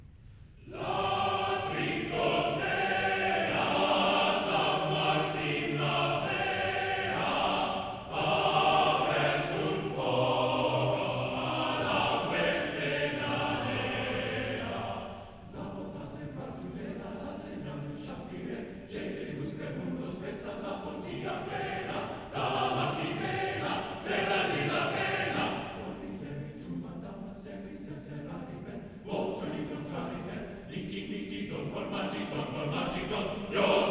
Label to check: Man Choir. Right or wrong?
Right